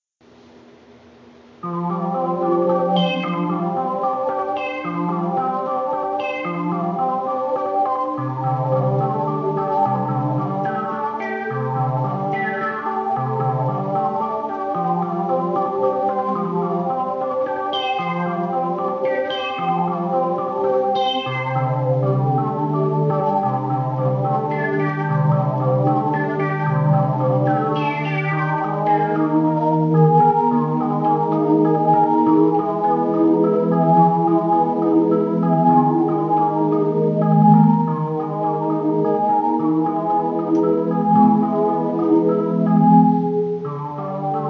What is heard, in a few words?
riff; mother1